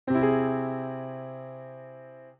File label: Caug itself